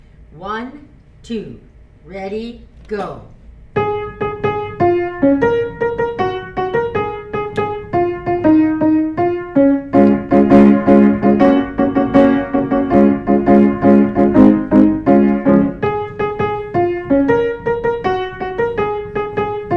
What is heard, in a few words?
ZAMBIAN PIANO